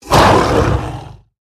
Park Panther Attack

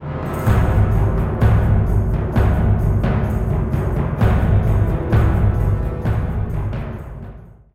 Battle Play Stinger

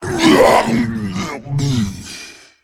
Male Faceless Death

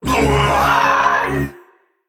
Male Mech Death

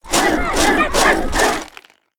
Bucket of Soldiers, Play

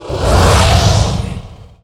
Dragon Spirit Attack

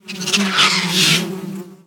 Redband Wasp Play

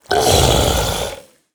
Ravaging Ghoul Death

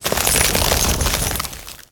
Ice Elemental Underlay Play

